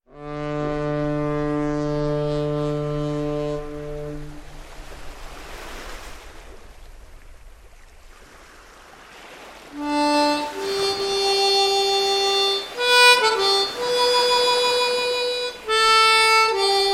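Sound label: solo G, Mundharmonikas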